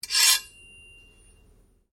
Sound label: Blade Scrape Medium Ring, Foley sounds for melee weapons; Swords; Combat